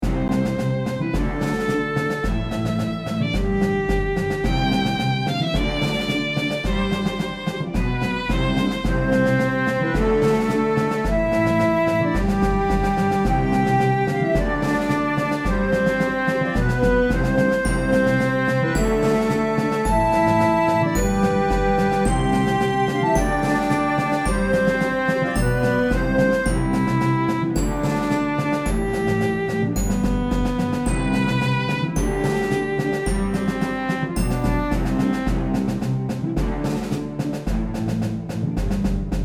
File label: Bluefin (Orchestra); dragon fantasy book i the volumes of westeria